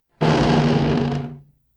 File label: door creak